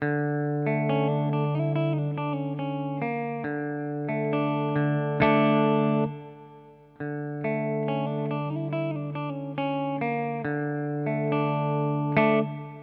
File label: HX Stomp Reverb Chamber; Vergleichstest